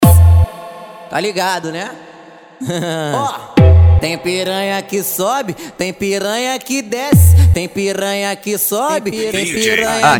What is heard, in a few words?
funk tumdumdum funk bass